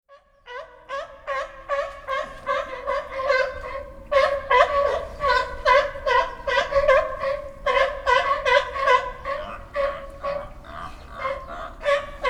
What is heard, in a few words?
lion seal